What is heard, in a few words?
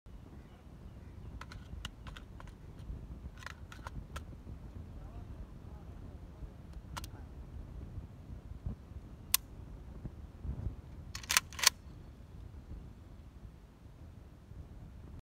Filling magazine; پر کردن خشاب